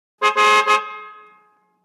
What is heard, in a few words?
1960 Car Horn Large; 1960's